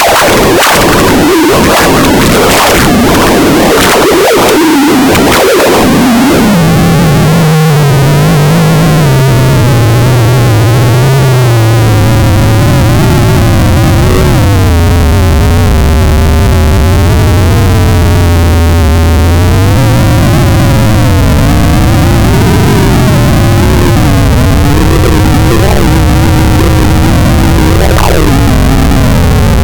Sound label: Pwm